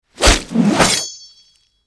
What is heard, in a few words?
Rhinoce atk